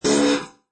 Distortion Fuzz Long Stab, Science Fiction Sound Effects; Scifi Production Elements, Set 2